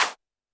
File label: Roland TD-20 Percussion